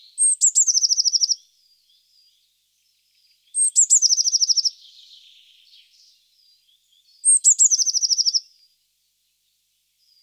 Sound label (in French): mesange bleue; TOULON; PRESQUILE